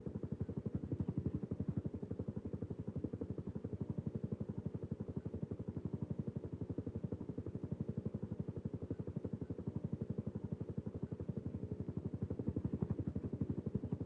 Helicopter; SynTex

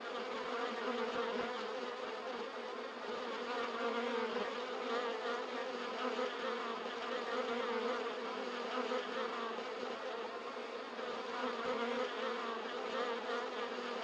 Bees; SynTex

